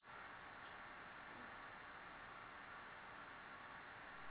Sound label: kitchen unknown